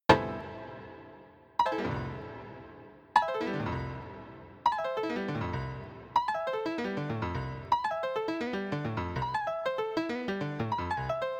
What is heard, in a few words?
19 tone